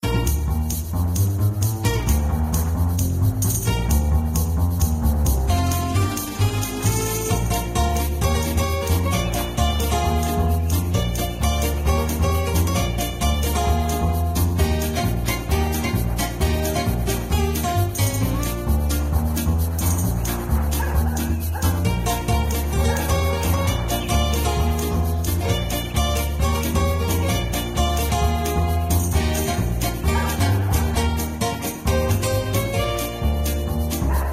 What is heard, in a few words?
main music; club penguin island; android